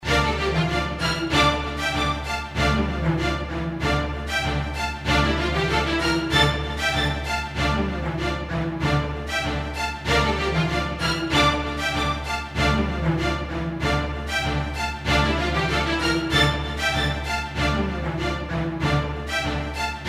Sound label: thunder strings unprocessed